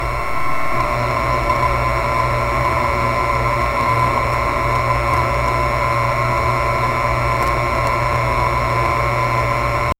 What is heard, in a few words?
ambience Geiger counter clicks